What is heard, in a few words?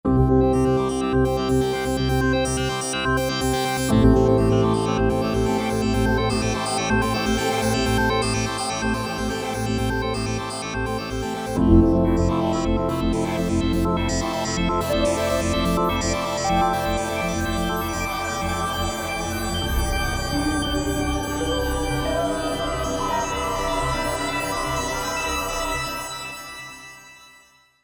Stereo Waves, synth